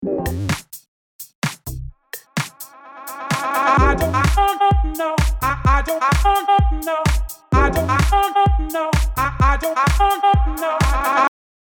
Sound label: Reverse Delay Swell